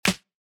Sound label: Clap